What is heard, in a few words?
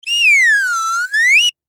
Cartoon Slide Whistle Blow Pull Out In; Miscellaneous Cartoon